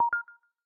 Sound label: Cable Connection, ui; Cubot X6